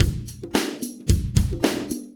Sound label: 70s breakbeats loop; USB Soundscan vol.46; AKAI